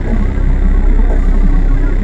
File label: terraforming station active; Stellaris